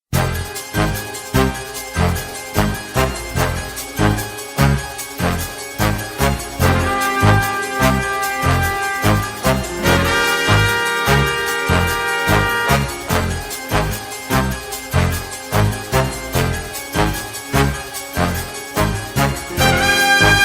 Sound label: suspense